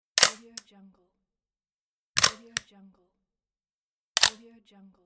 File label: old camera shutter